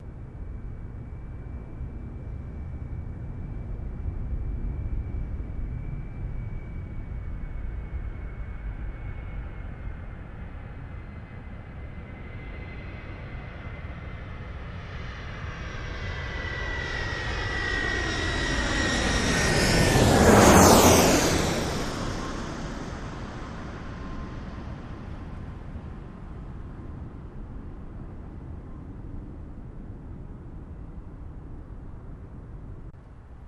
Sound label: Airport Lot Fly Over, overhead bys